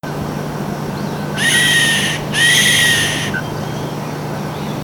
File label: Piculus flavigula; N Santana; PETAR; Brazil